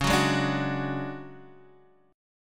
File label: D Major 7th Flat 5th Db, chord